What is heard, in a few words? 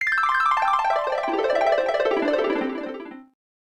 Harp, ringtones; Fujitsu F-42A